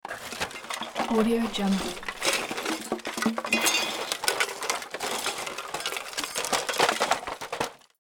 junk debris spill; hollywoodedge

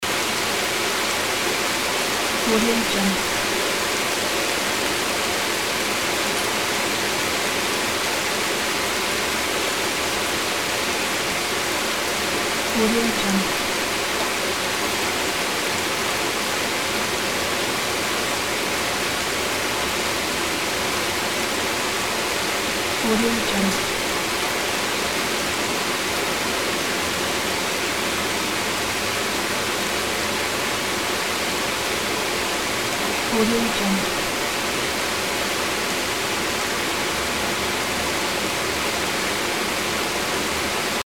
strong rain; soundfoodsfx

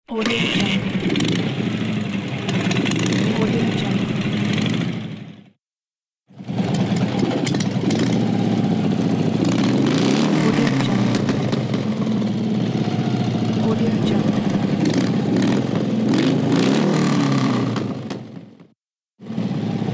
motorcycle sounds

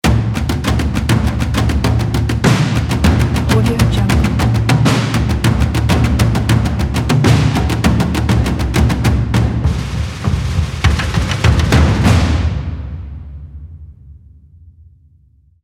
heavy thoughts logo ident opener

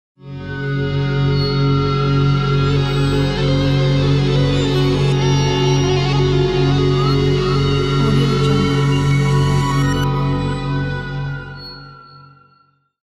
dystopian arabic logo

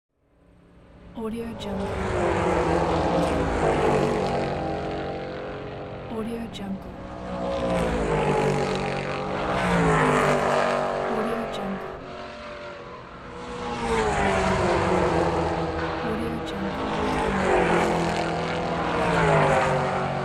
car racing

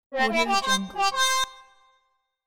trumpet fanfare; sweet honey

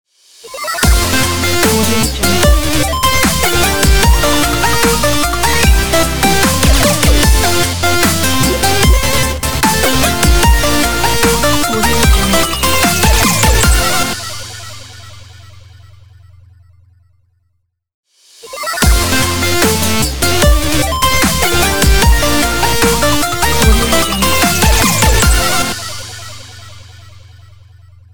8bit pixel game intro; petruality

